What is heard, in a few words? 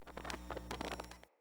decoy cardinal static; Objects; Thawtide